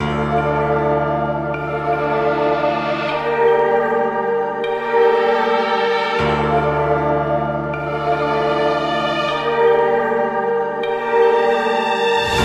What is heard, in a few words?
keys, Loops, Sad